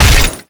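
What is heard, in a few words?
metal gloves hit robot; melee impacts; Garry's Mod; tf2; mvm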